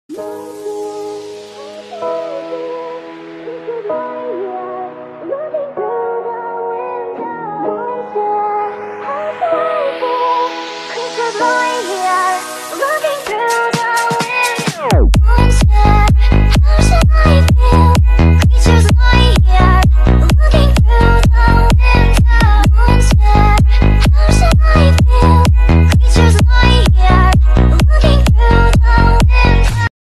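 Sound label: tik tok, sonnerie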